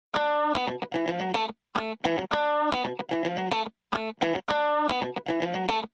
Cool Msg Tone

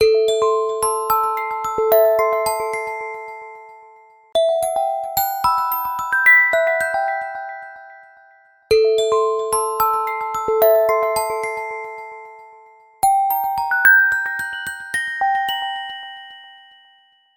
Lovely Modern Ring

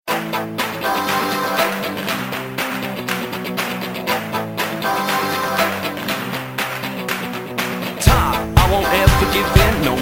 Clapping

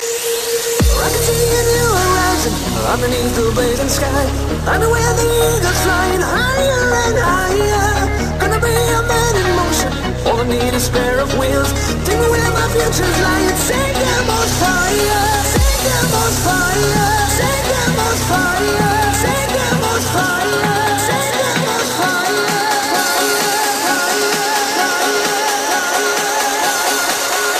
2-4 Grooves

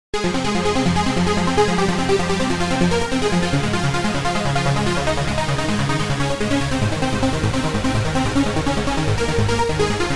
Good Trance Sound

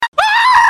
Screamer

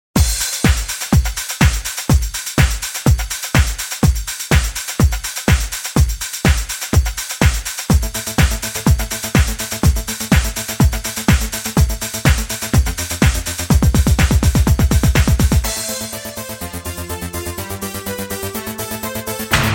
Party Mixes

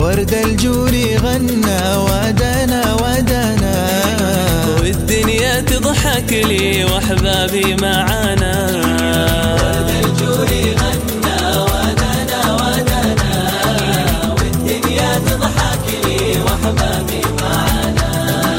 Arabic Music

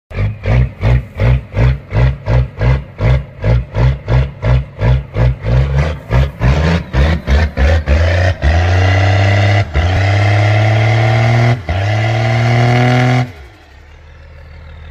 Cummins lope

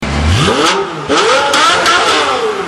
Pagani Zonda F Sound

